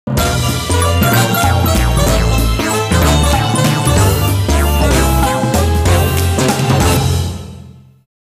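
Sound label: course clear; Taiko no Tatsujin Doka to Oomori Nanadaime; PS2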